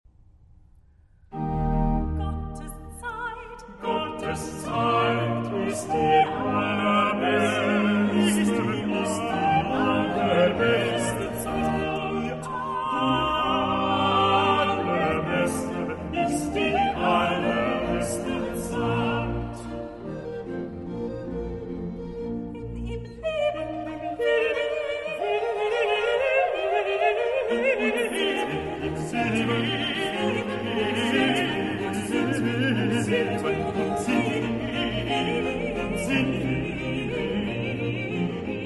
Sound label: Coro Con Choral; Bach - Cantata BWV 106; 2. Coro Con Choral